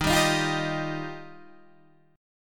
D sharp Major Add 9th D sharp Guitar DADGAD, chord; 13,10,13,10,10,13; chordshape